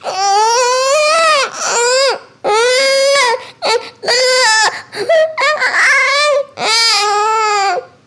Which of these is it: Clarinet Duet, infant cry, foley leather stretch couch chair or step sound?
infant cry